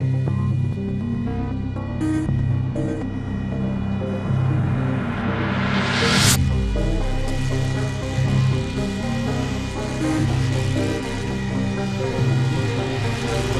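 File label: music, synth loop; transformers war for cybertron gamerip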